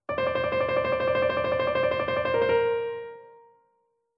Verzierungen Triller Barock Nachschlag; Paradiddle Kapitel 1

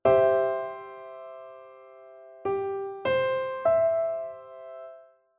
Dreiklang Dur Umkehrung

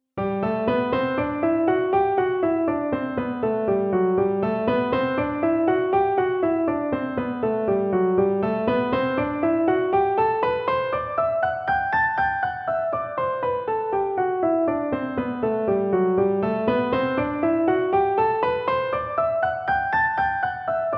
Tonleitern Spielen G Dur; Paradiddle Kapitel 1

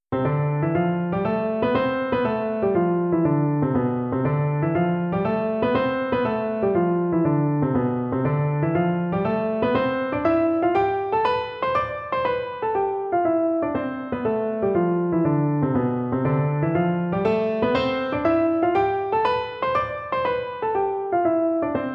Tonleitern Spielen C Dur dotted; Klavier

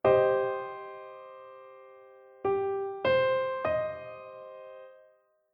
Dreiklang Moll Umkehrung

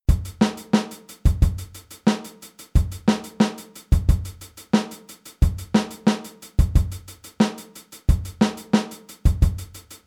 Groove 16tel

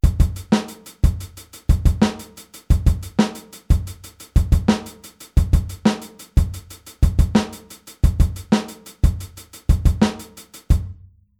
Groove 16tel; Standardnotierung des obigen Grooves; HiHat; Snare

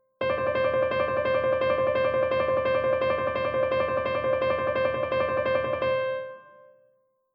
Verzierungen Triller 16tel, Paradiddle

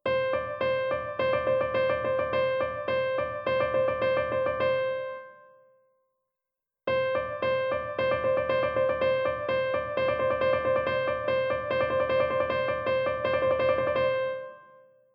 Verzierungen Triller 8tel 16tel Accel; Paradiddle Kapitel 1

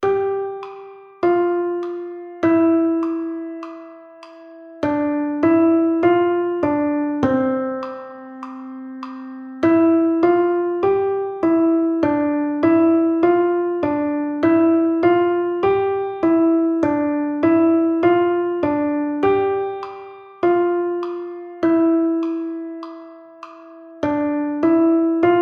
Klavierkurs Summ Summ Summ Click; Melodie rechte Hand

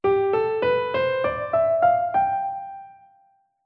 Tonarten G Mixolydisch Up; Paradiddle Kapitel 1

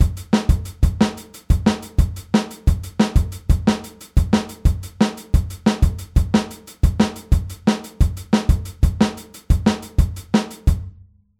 Groove 16tel

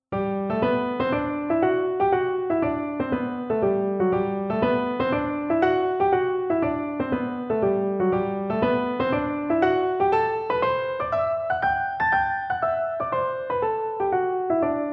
Tonleitern Spielen G Dur; Paradiddle Kapitel 1; dotted

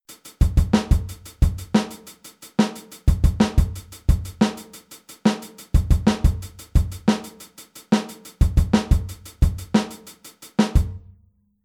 Groove 16tel; Standardnotierung des obigen Grooves; HiHat; Snare

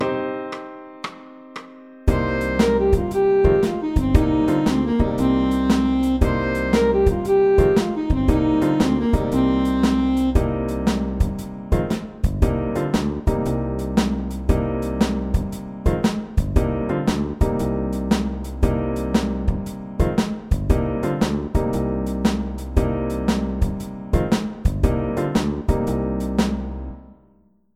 Pentatonik Moll Absteigend Shuffle

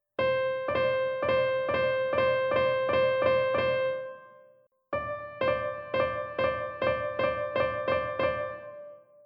Verzierungen Triller Punktiert Accel; Paradiddle Kapitel 1

